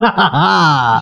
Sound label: laugh